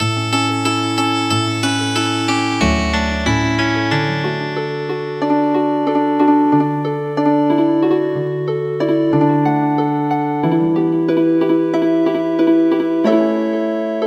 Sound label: Healin Harp; midi; traditional